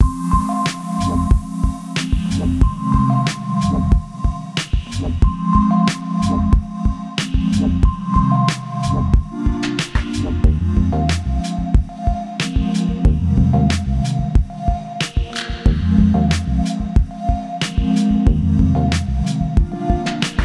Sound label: bg2 - F - 92bpm